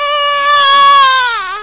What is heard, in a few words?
BABY CRY; VOICES